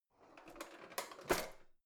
old drawer creaky slide softly out

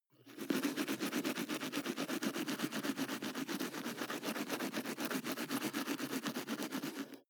teeth brush dry closed molars

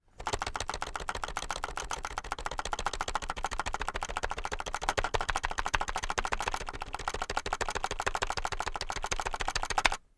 keyboard external backspace sequence fast

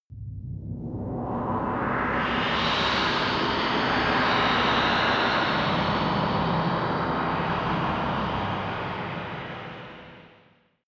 noise stinger

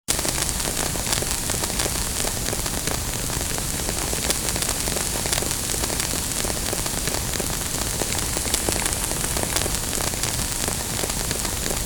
noisy crackling atmo

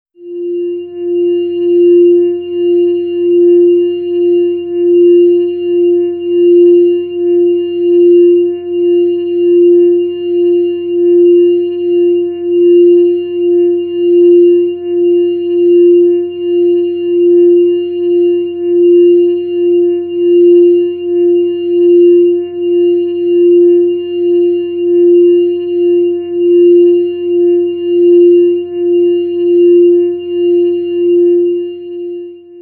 organ tone f

